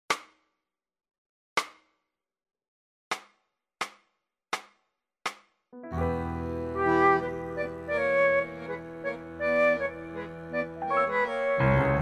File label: flute